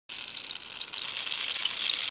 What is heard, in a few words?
Tinkle